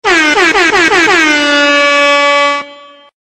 airhorns; Pokemon Reborn E19

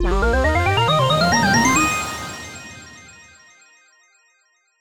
Level Exit; The Adventure Pals

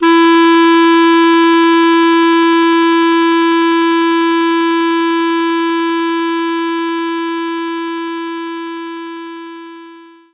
Clarinet E4; knights try

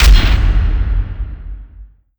Score Blast

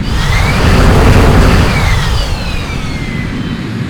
Jetcar Rev; Nicktoons Nitro; arcade

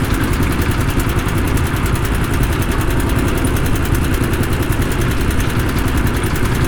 Conveyor Belt

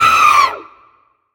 Raptor alerted

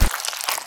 Stab; power drill massacre demo gamerip 2015

